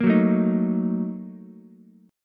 A sharp Major 7th Suspended 4th Sharp 5th, down, Ukulele; strummed